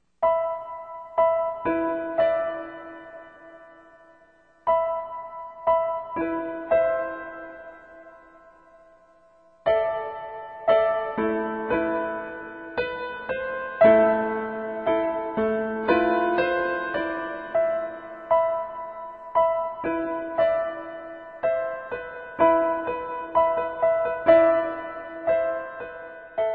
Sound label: score sample; Voices from the High School